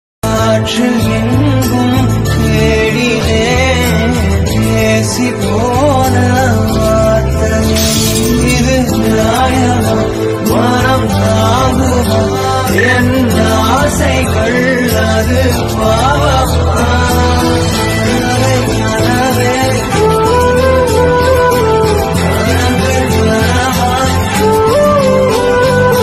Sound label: Tamil sad status; girls feeling status in tamil; broken girls status